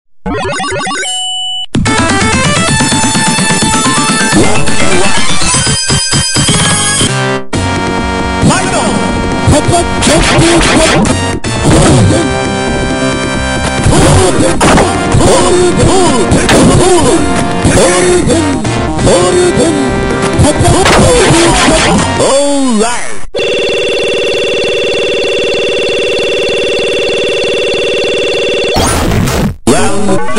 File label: ringtone